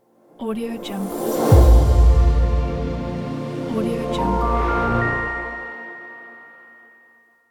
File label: airy logo